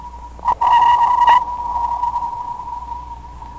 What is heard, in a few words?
ping, sonar